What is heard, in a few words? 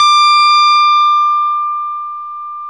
Les Paul, guitar